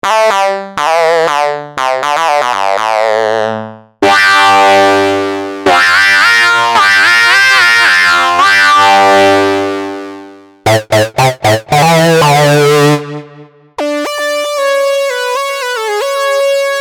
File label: presets leads and unison; ps-20